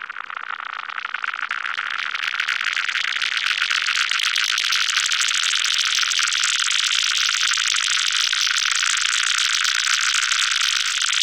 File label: CHATT, SYNTH FX; Zero G; Malice In Wonderland